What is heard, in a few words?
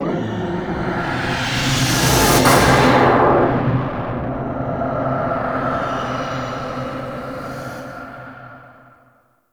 Breathy Vocal; Ambience; Warframe; Man In The Wall